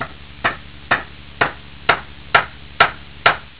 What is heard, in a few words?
hammer